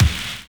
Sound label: RAIN BEAT; Univers Sons - Kitch 70s